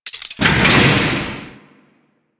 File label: Heart Vault Platform Ascend; Ambience; Warframe; Infested Eris